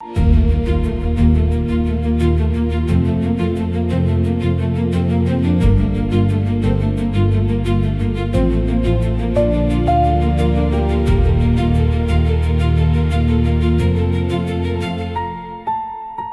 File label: loop; bgm; education